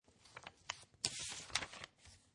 Paper Turn Page, Magazine or book, turn page, single page turn.; Classroom; Schools